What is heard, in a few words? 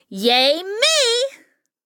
Upgrade; Harley Gwen